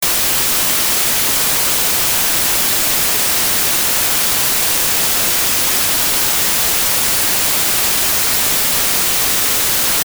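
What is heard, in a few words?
white noise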